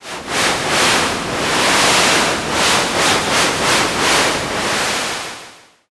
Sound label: river